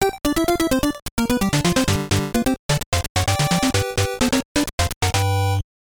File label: Area Pattern Clear; Nazo no Kabe: Block Kuzushi; FDS